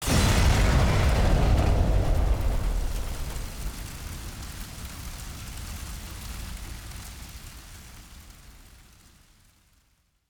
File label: Explosion Large; 环境类音效